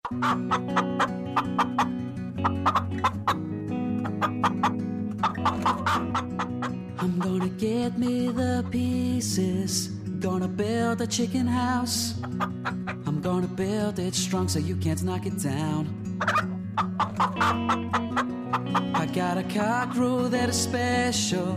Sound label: chicken house